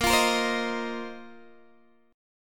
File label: Bb Suspended 2nd Suspended 4th, Guitar, DADGAD